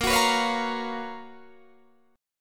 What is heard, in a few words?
Gb Major 11th